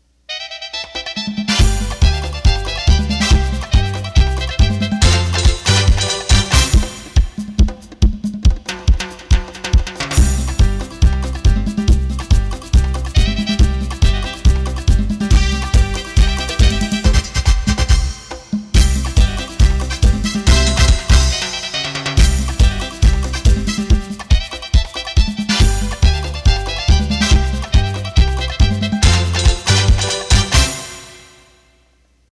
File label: Merengue